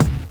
studio steel kick drum c key

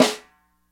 wet snare one shot b key; Duration 0:01; Samplerate 44100 Hz; snare drum samples

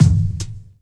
mid range kick single hit c key

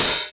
muted open hat d key

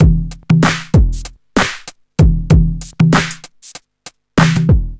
96 bpm breakbeat e key, kick tuned to the E note

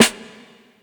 long snare drum sound a sharp key

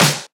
Sparkly Snare Single Hit D Key

